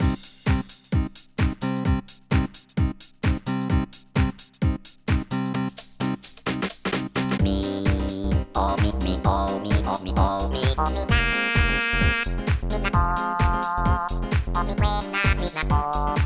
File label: Tango, Remix